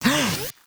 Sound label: Green Charge; netent